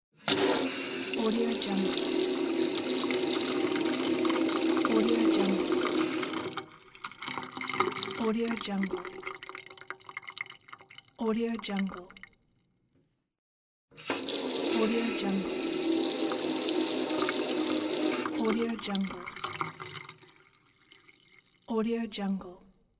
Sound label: kitchen faucet running water